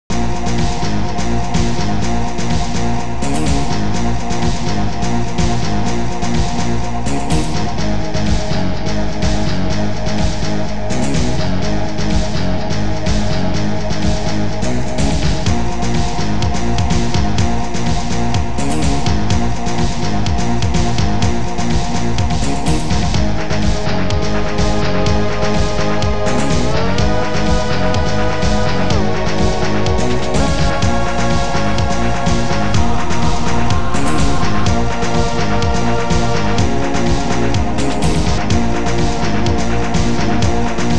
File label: intro tune; pure metal coders; zapper